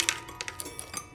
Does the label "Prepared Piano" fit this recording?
Yes